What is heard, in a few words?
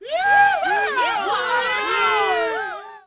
CROWD POS LOW; Cars; XBOX